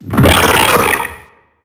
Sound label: death; larry jr